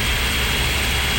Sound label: circle saw rev; tf2